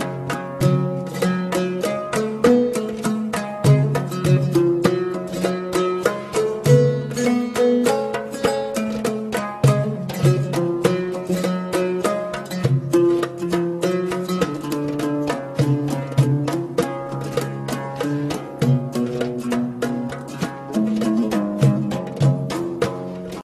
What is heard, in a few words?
radio music intro